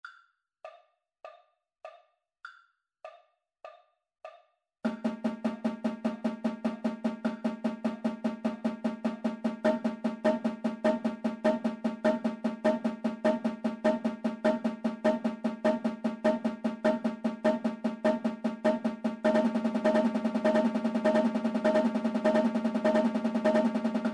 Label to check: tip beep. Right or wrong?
Wrong